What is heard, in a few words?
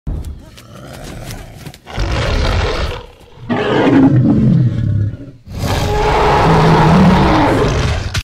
Spinosaurus, غرش